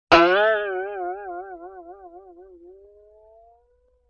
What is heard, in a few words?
Boing Box High; Vintage Cartoon; Hits & Tones